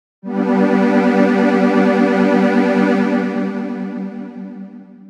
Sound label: Epic Aha Pad, jup8